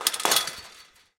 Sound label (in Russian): korotkij drebezzhashij zvuk